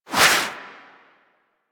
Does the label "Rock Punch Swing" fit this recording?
Yes